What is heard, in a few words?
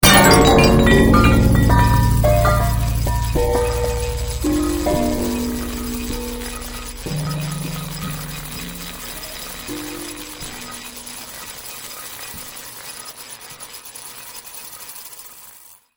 Long Loop Mix